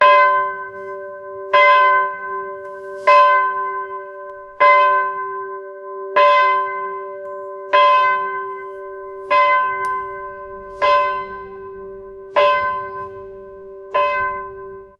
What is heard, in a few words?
soothing church bell